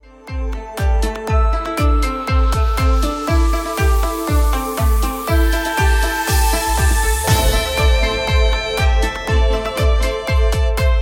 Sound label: Plain notes; MIUI12